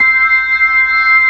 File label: B3, Drawbars